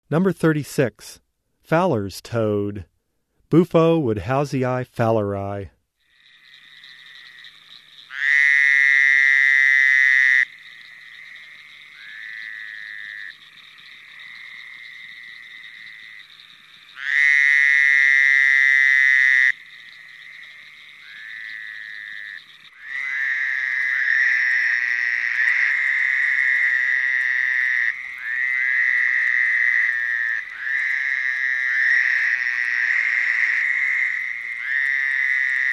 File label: Bufo fowleri - Fowlers Toad; Frog Calls; Herpetology; Bio227 - Herpetology